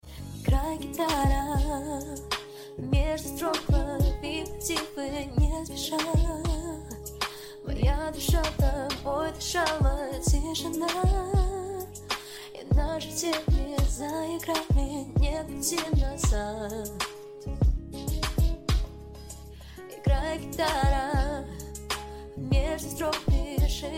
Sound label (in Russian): igraj gitara